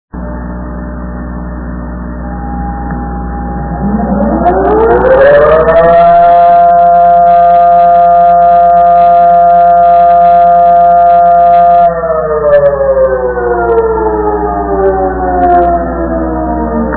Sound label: sirens; edgehill